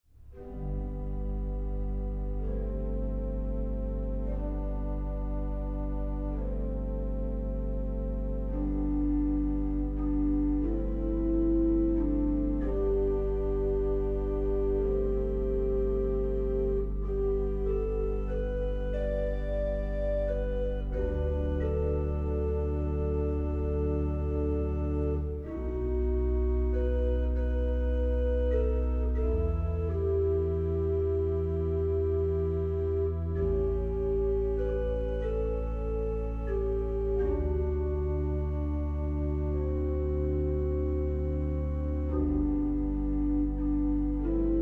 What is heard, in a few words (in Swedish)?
Rysk folkmelodi, orgel; Spela orgel